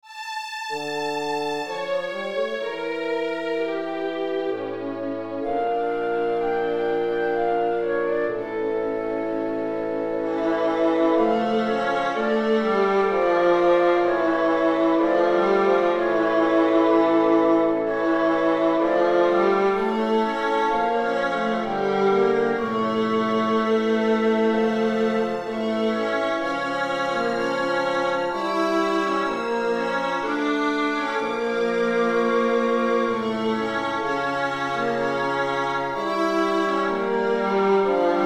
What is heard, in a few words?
Viola Concerto; II. Largo